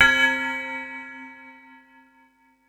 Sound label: mallets